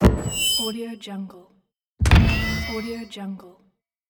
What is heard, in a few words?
microphone feedback